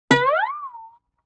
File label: Guitar Plink Slide; Vintage Cartoon; Musical Elements